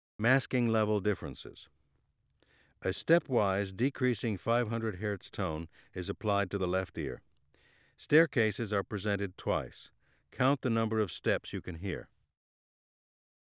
Masking level differences, Auditory Demonstrations